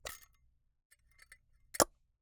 Camera, Argus, aluminum, steel, professional, fully mechanical, film compartment, open, rattle; c1970; close; Eiravaein Works; Vaeyan IV